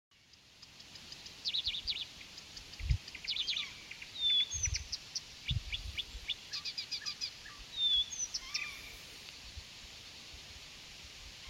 viitakerttunen; linnut

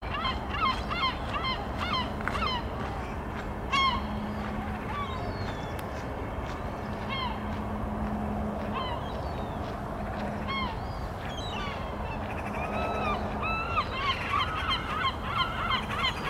harmaalokki lento; linnut

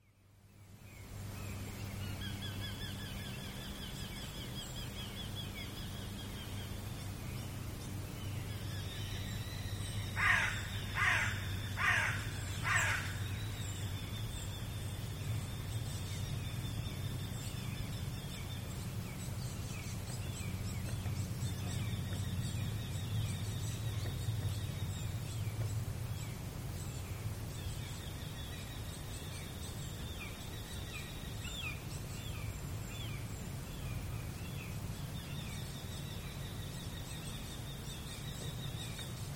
kaenpiika; linnut

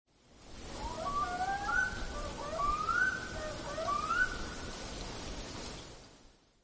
kuikan huuto; kuikka; soidinhuuto; linnut

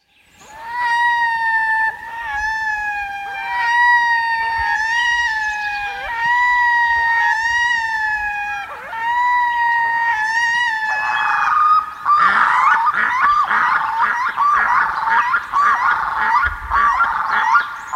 kaaksoidin; kaakkuri; soidinhuuto; linnut

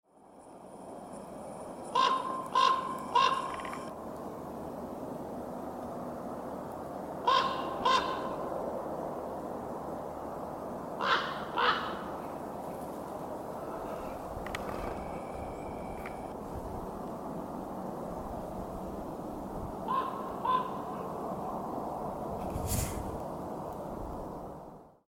korppi; lento; linnut

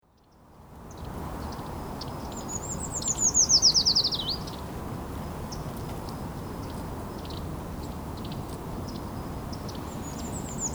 puukiipijä; linnut

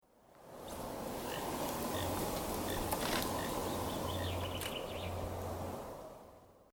telkka soidinaani; koiraan soidinääni; linnut

